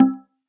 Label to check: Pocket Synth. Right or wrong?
Right